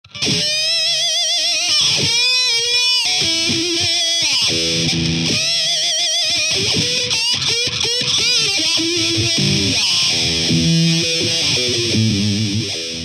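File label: GFX-8 Patch Metal Wah; MTL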